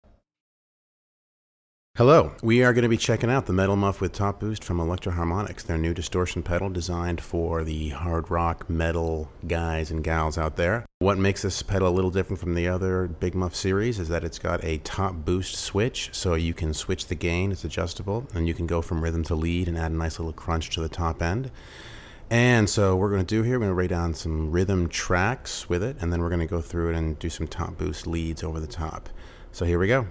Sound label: Metal Muff Distortion